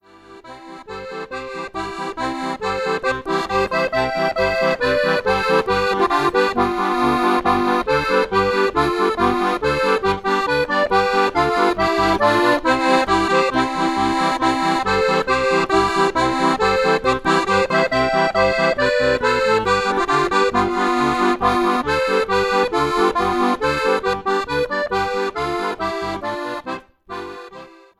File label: Grand Prix Polka, oergeli